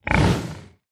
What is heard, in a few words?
hoglin idle; Minecraft; 疣猪兽（我的世界）